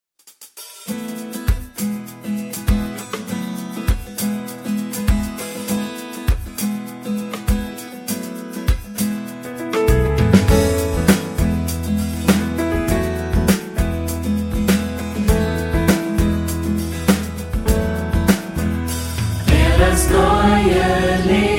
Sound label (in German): StudioChor